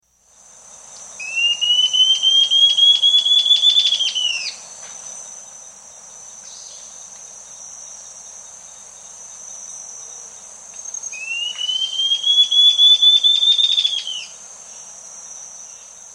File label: Ocellated Antbird; S. America